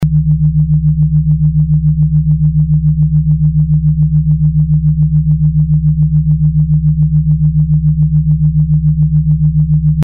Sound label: Four Sine Hum